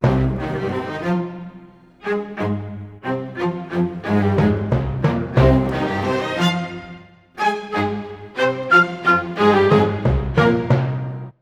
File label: 90 c min full mix, Orchestral arrangements; PowerFX - Downtown Orchestra